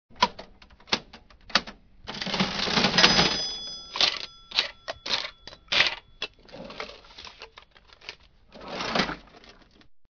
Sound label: play Buy Sound